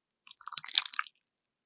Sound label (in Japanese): くちゅ音